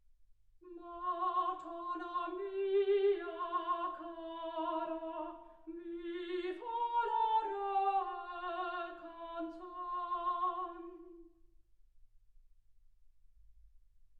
soprano

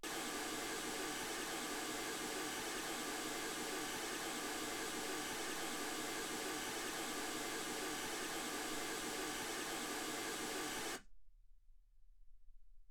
mls16 48kHz

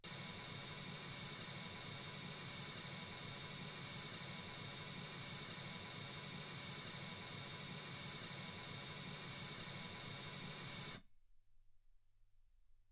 mls 48kHz

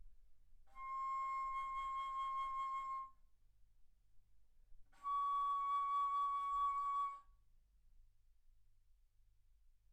Bass Flute C6 Db6; ff

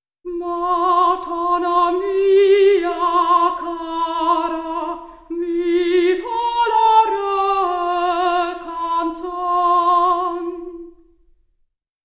soprano

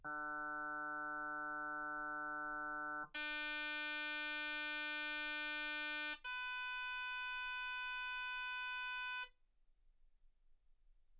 harm sinus 48kHz

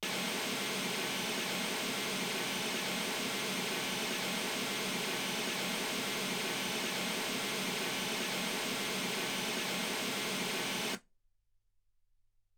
mls16 48kHz

